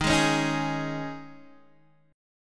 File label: D sharp 7th Suspended 4th